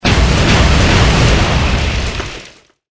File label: bomb